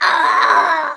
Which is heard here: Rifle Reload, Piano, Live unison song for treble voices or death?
death